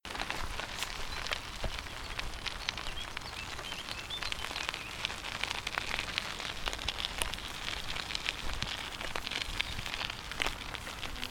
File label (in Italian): ruote su ghiaia; Ruote sulla ghiaia; strade con la ghiaia che quando ci vai sopra la bicicletta trema.